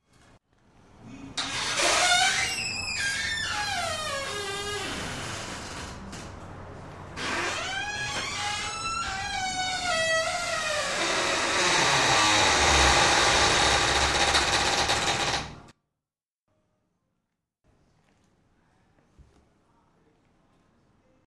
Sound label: Creaking door